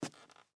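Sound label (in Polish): kroki cichsze pojedynczy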